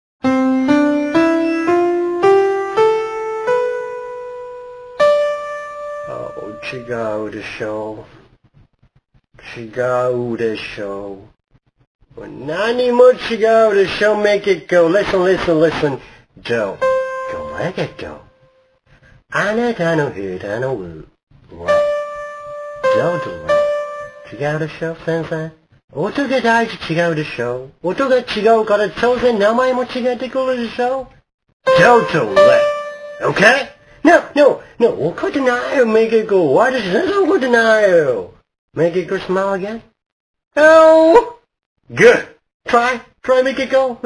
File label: piano